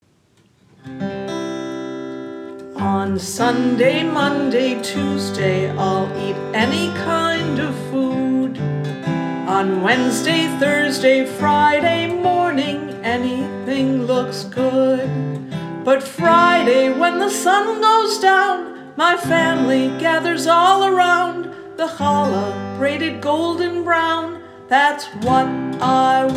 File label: Challah Song